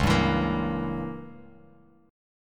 C sharp Diminished 7th C sharp down Bass Standard; C#dim7 strummed; JGuitar

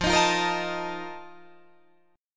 F 11th F, down, Guitar Drop D

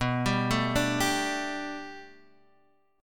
B Minor 7th Sharp 5th B Guitar DADGAD, chord

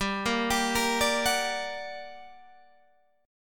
G Minor Major 7th, down, Guitar, Open G

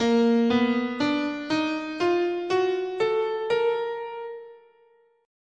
A sharp Double Harmonic Major, scale

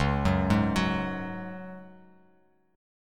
Db Suspended 4th, Bass Standard; 9,9,6,11; arpeggiated

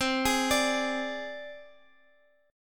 C Suspended 2nd Sharp 5th, down, Guitar, Open G

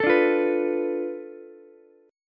B Minor 7th Flat 5th B Ukulele; Bm7b5; strummed; JGuitar

